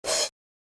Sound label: X type Impulse